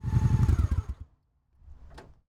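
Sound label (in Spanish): RALENTI STOP, motorcycle; YBR125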